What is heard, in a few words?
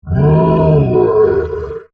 Monster Groan, Horror, Monster, Groan, Creature; Monsters (Sound Effects:Horror)